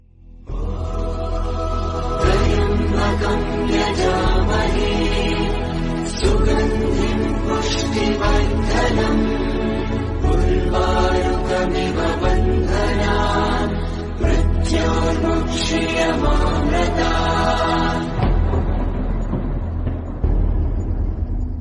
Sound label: Tamil Ringtone